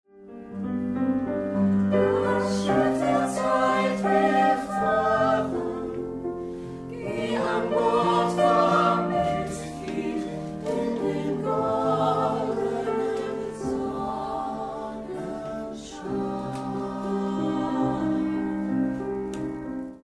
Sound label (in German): wiegenlied ensemble